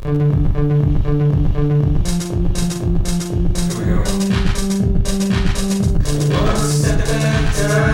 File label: reverb